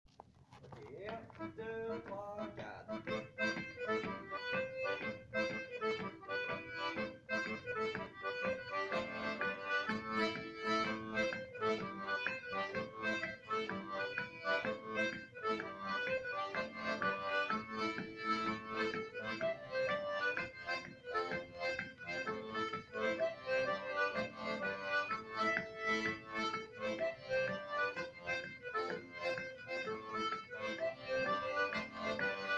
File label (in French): Entre deux tempo normal; Musique d-ensemble; Cercle circassien